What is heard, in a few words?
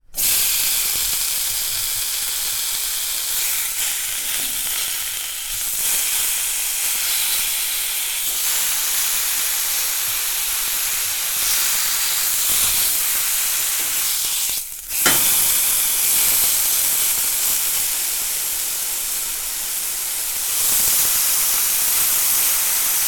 food frying bacon